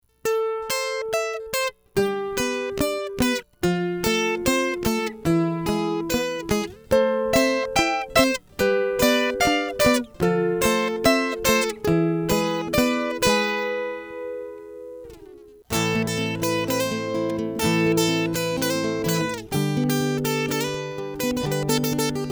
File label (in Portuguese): Viola, seducao cigana